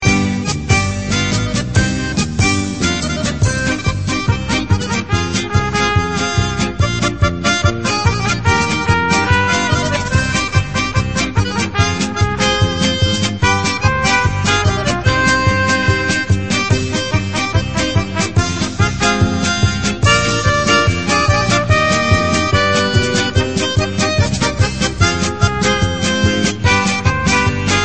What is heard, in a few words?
Instrumental